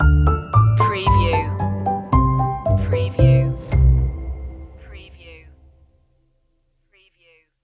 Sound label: tippy toe stinger